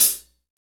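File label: HH HH, Roland, hi-hat; 90 s Sample CDs; Sound & Vision - Gigapack I CD 1 (Roland); Real-Kit s 6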